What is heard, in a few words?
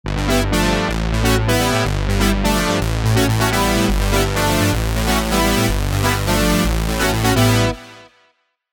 Chord Memory, keys; revealed diva keys vol 2